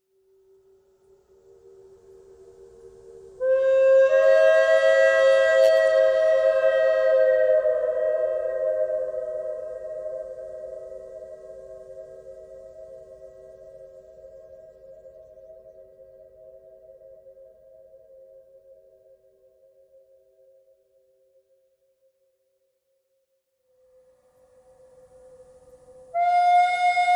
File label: bird cry, birds